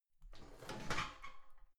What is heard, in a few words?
garbage can open lid squeak